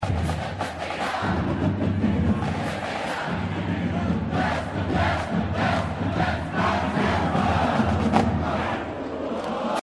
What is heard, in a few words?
cadence